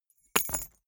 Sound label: key ring small drop carpet hard